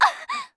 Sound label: fall; monster; bkfourth